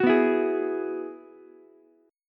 G sharp Minor 7th Sharp 5th, Ukulele; strummed; 11,11,12,11

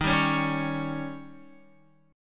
Eb 7th, Guitar; strummed; x,6,7,6,7,9

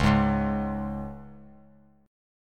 Db 5th Db down; Db5 strummed; JGuitar

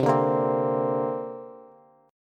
C 7th Suspended 2nd down Bass; C7sus2 strummed; JGuitar

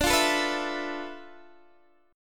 D Minor 6th Add 9th D, Guitar, DADGAD

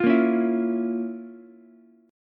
F Major 7th Suspended 4th Sharp 5th, Ukulele; strummed; JGuitar

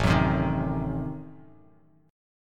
A Diminished 7th A down Bass Standard

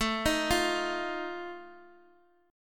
Eb Suspended 2nd Flat 5th, A

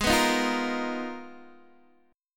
Ab Minor 6th Add 9th, Ab, Guitar, DADGAD

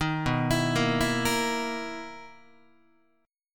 B-Major 11th Guitar, BM11 chord; arpeggiated; JGuitar

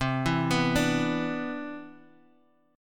C Major 9th, C, down, chord

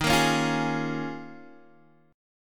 Eb 9th Suspended 4th, Guitar, Open D

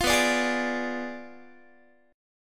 B Diminished 7th B down Guitar; strummed; tuning 4A,4E,5A,5D,5G#,6C#

